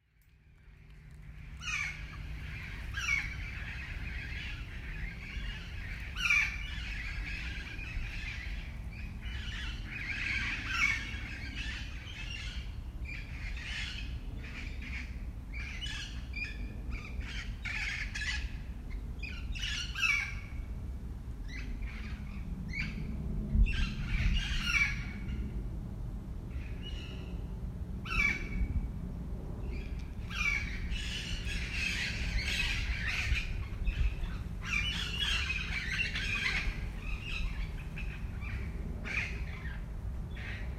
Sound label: Parakeets